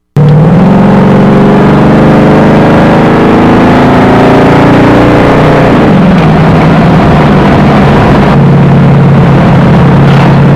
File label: Charger In Car